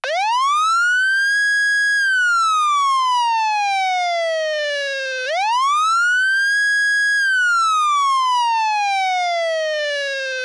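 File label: Federal Signal Touchmaster Delta Scan, siren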